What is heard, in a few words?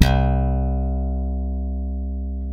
ALEMBIC BASS THB C 2; Masterbits CLIMAX 7 - Bass